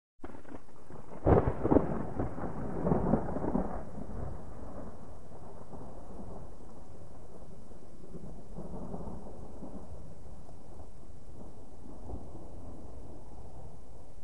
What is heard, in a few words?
Thunder; Small Crackling Thunder with Light Rain On Pine